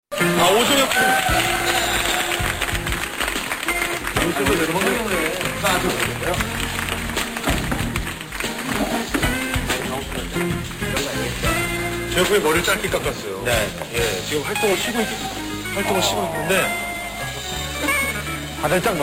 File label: jazz; J-Fusion